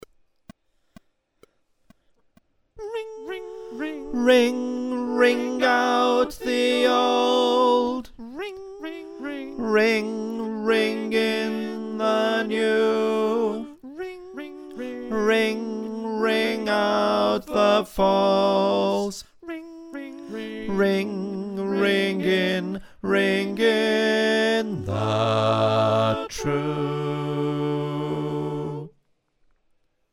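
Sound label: bass, choir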